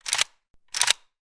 gun ak 47; still north media

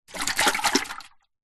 kettle water movement inside lid closed; household

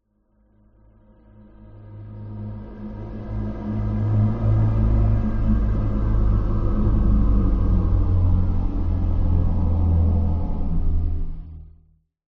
horror ghostly pass

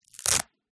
Backpack, Velcro, Strap; PMSFX

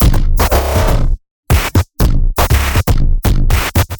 Filthy Percussion Loop; soundbits

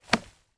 impact large tree branch drop on ground

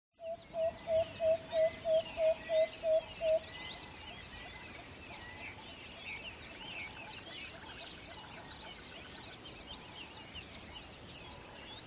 ATMO BUSH Water Hole Early Morning; smartsound

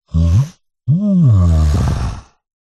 horror yeti monster growl grunt inquisitive; zapsplat

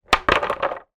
impact wood plank large drop hard ground; zapsplat

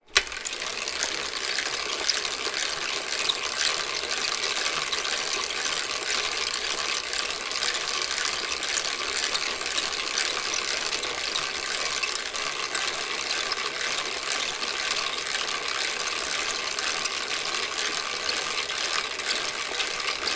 transport bicycle childs peddling

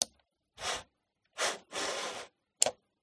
computer mouse drag and drop

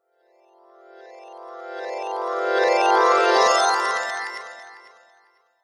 fantasy magic glissando reversed bright twinkling

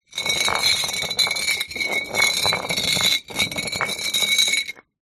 foley glass bottle roll on concrete

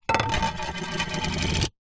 household cd disc down and spin wood

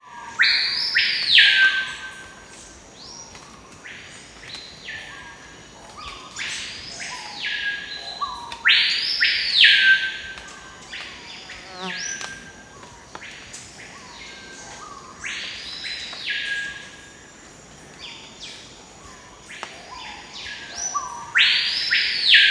nature tropical rainforest ambience venezuela; felix blume

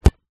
sport soccer ball kick

